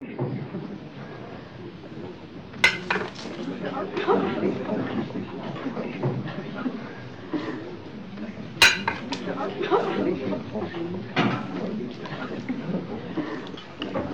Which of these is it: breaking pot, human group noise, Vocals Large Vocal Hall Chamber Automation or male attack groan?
human group noise